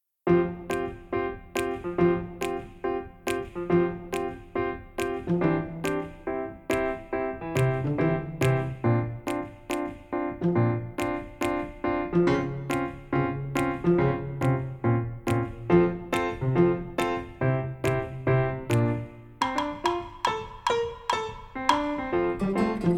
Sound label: no vocals; 21st century showcase